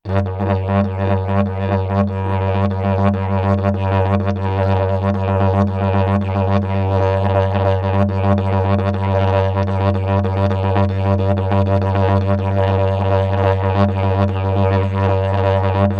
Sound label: yidaki solo